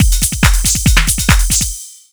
TECHNO BEATS 140

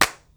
CLAPS; drum; CLAPS+SNIPS

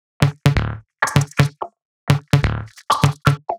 perc 128, Electro percussion loops; Anthemic

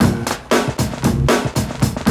KLAPPER 116, loop; 115-121 BPM

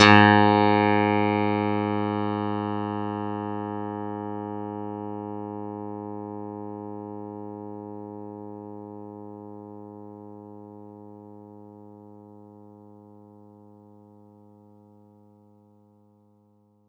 Clavi, Digital Keys

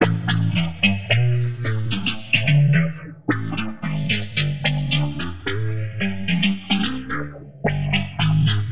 Warped Bass, 110 F; Loops; The Changes; Touch Loops; CosmicFunk

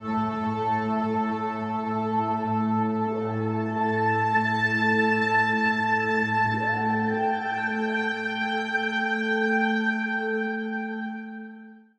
SPACE SYNTH 140 A; SpaceSounds

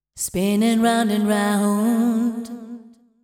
deep house female, 125, A, vocals

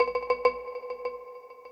FX Industrial 140

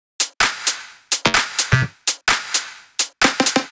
tops 128; Anthemic.Electro; tops loops

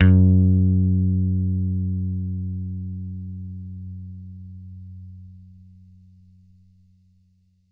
GOOD 80 PICK F#2, bass; Bass Mace; DirectWave

